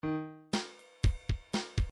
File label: midi file grooves rock; Bdf Midi File Grooves